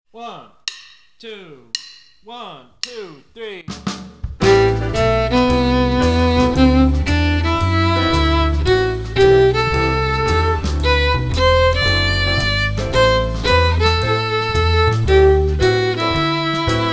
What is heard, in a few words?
Play Along G Major Scale With Rhythms